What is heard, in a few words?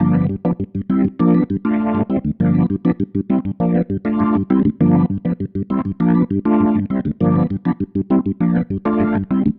Pattern, Organ